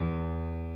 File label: key